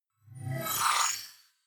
flyby splutter

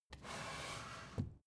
book shelve soft short

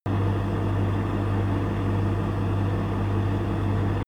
fridge running

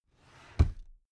drawer cabinet carton close heavy halfway

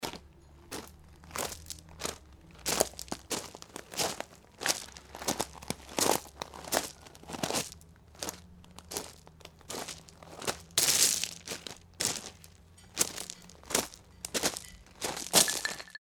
steps on stony path

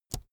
keyboard laptop space push soft

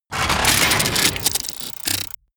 robot transformation metal

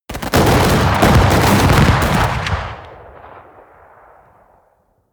volley large group close

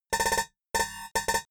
typewriter sequence

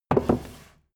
book shelve hard

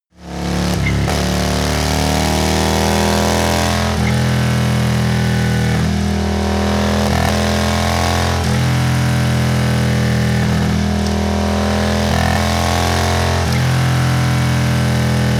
motorcycle harley engine thrusts

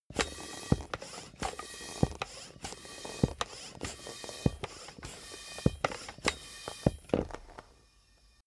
soccer ball inflate sequence

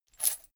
chain mail armor movement

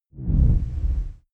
whoosh low tonal distortion

